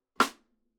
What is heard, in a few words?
rimclick, Snare